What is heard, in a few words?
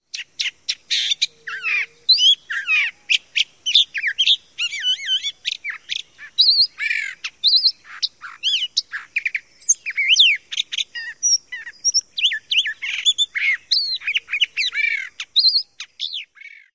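song, long-billed thrasher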